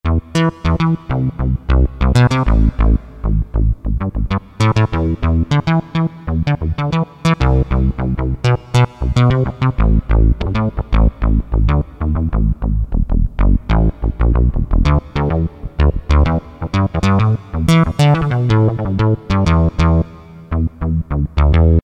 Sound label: Syn BASS, Korg DSS-1